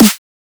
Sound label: snare drum; foxdot default